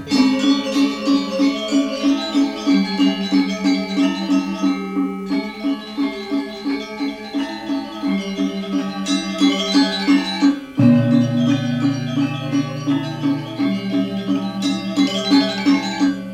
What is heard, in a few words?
bali loop